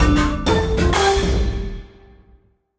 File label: win Medium; wild turkey